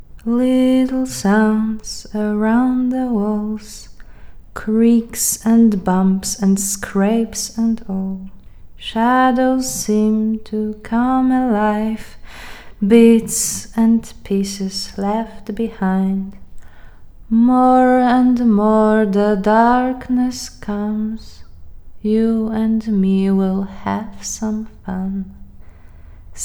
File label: lullaby stereo louder